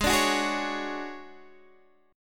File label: D Major 7th Flat 5th G sharp, chord